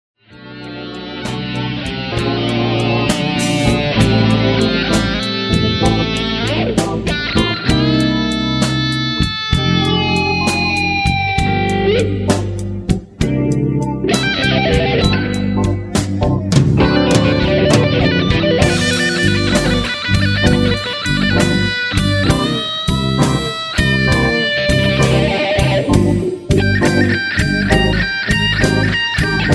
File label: Blues SOLO